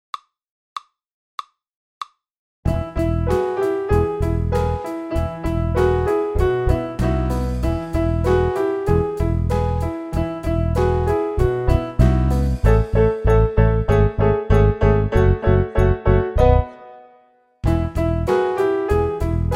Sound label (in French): basson